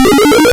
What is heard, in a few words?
Power Up Item Appear; SMB1 Sounds